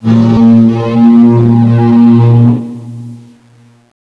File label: roar, kraken; CSO KrakenBoss